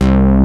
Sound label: ANALOG COMBO; OMI - Universe of Sounds